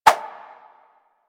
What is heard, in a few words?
Spheres Throw Whoosh